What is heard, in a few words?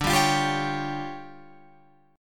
D Minor Major 7th Double Flat 5th, D, Guitar Open F; DmM7bb5; strummed